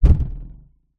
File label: foley balloon hit impact boing